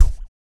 BOOGAROLL, Vocal Blues Soul; Spectrasonics Vocal Planet

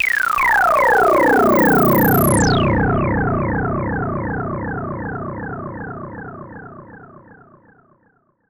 SH-09 Delay

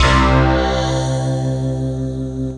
CLASSIC PPG, PPG WAVE; Rubber Chicken Software Sample Bank

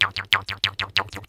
KHOMUS

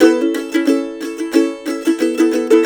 COUNTRY

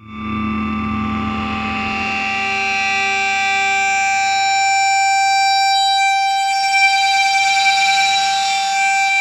Feedback, Industrial; Nasty

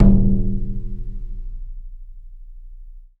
HYBRID, Heart of Africa; Spectrasonics; AF.HYBRID

